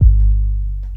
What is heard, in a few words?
Kick Drum, Drum One Shots; Sonic Foundry (Sony Creative Software); Ambient Grooves; Kicks